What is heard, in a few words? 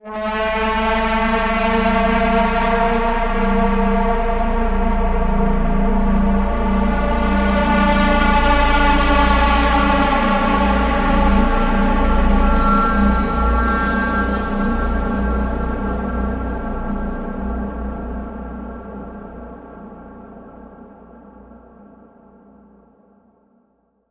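Bend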